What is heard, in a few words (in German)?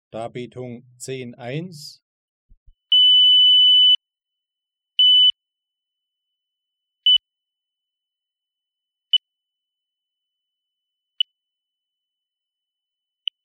Lautheit, Dauer, Tonimpulses (3 kHz; Die Lautheit eines Tonimpulses (3 kHz